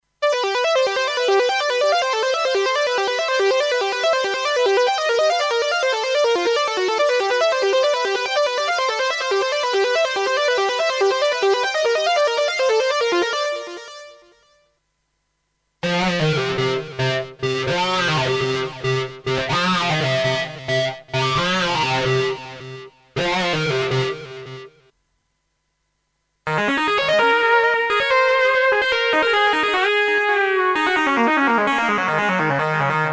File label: solo lead